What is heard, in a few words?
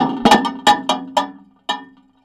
Raw, contact mic, Tin Of Guitar Strings; musicradar; contact mic madness